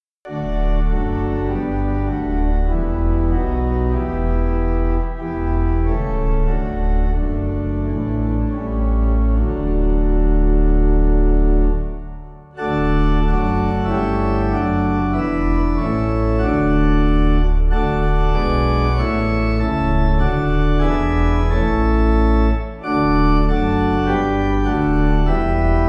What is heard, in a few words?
Forty Days Pipe; Buckland